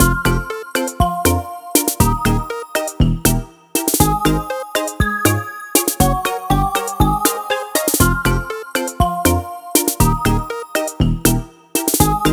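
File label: BGM Toy Result Draw; clubhouse games 51 worldwide classics 2020 switch gamerip; Dotabata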